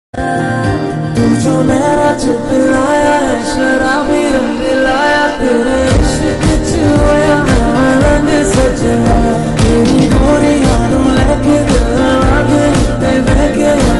Hindi Ringtone

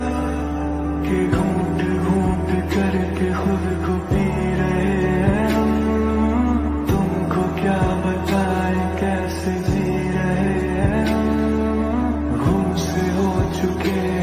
Bengali Song Ringtone

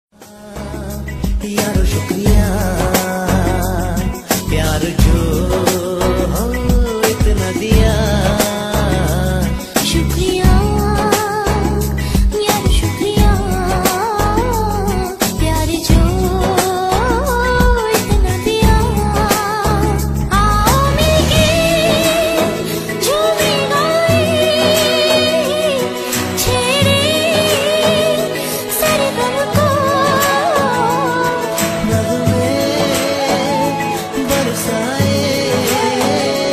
Friendship Song Ringtone

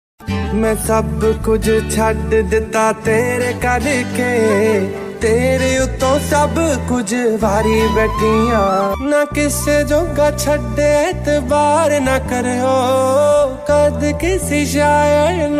Punjabi Song

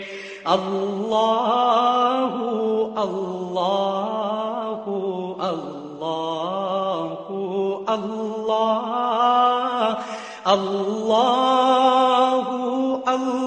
Allah Hu Allah Ringtone

